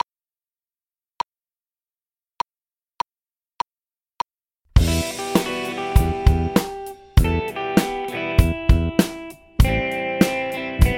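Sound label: Funky Guitar Drums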